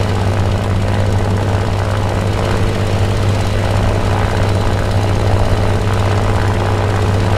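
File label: me109 cockpit right, engines; War Thunder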